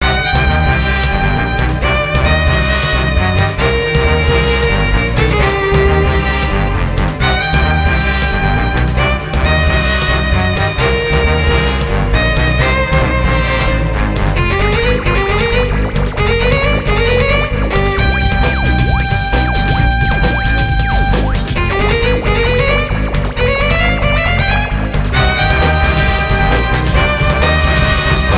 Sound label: music; Pacificwar